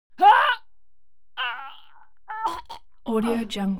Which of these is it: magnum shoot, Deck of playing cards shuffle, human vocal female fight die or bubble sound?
human vocal female fight die